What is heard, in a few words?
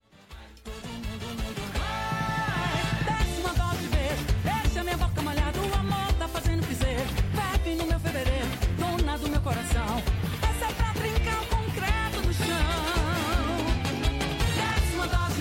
samba; Lotte World